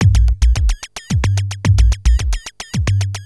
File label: Industrial Beats